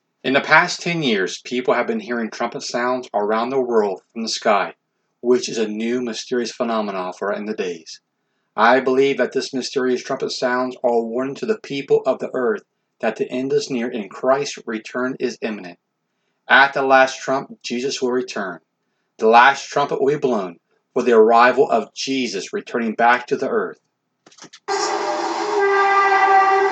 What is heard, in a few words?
Trumpet